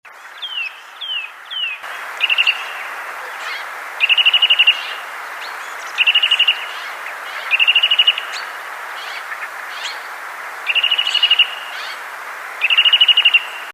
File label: Sitta europaea